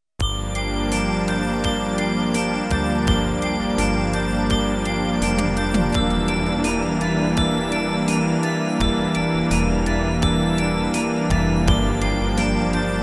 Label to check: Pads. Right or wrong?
Right